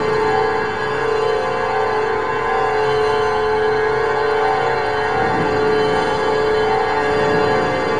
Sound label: Drone Ambient; Drums & Drones